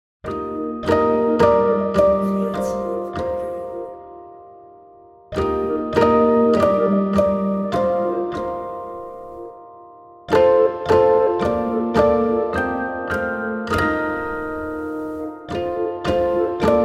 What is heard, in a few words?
piano pizzicato